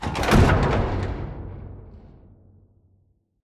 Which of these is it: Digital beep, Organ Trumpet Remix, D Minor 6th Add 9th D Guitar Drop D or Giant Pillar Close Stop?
Giant Pillar Close Stop